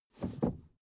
suv 1st gear release soft; verkehr transport; autos

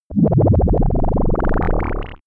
bubbly buzz; bewegung; comedy-cartoon; soundpool

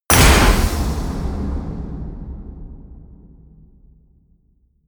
hit metal

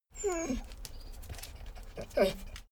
dogo argentino whine snuff; natur-tiere; hunde

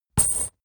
metal chain impact

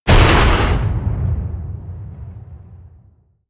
smash, baugewerbe

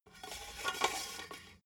metal scaffold pole slide; industrie-wirtschaft

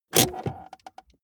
car bmw e46 interior handbrake pull up fast creak

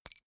key ring small catch; objekte; schluessel

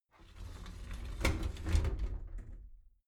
shower slide door inside close soft halfway

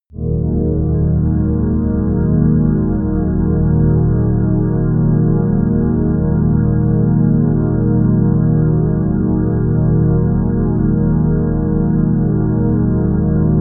wide world, music elements

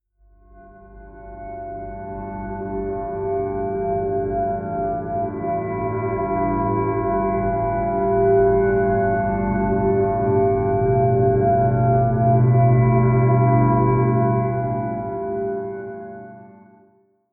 atmospheric peal deep, drones; musikelemente

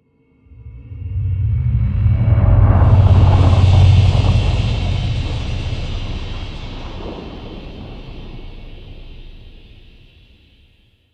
rumble rolling thunder

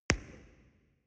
explosion; hits-explosionen

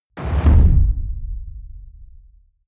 heavy sub noise hood

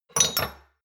shot glasses pull out hard, gastronomie; soundpool

